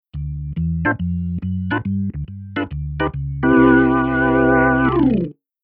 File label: Keyboard Organ Vib Cho